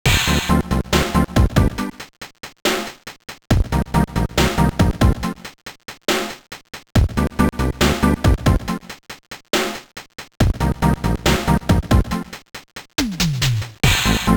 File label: Pause Menu; Danny Phantom Urban Jungle; GBA